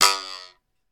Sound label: comedy twang; sound effects one